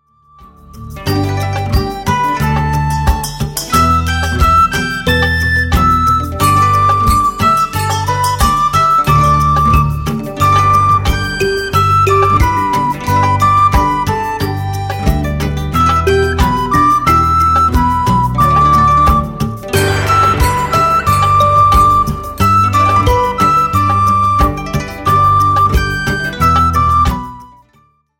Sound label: the bamboo flute